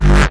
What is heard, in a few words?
Offbeat Bass G; Vengeance Samples